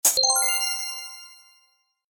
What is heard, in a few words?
ting